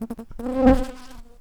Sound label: Recording, bumblebees, Sylvarum workers